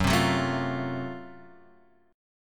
Gb Minor Major 7th Flat 5th, Guitar, Open F, chord; strummed; JGuitar